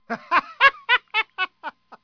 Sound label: people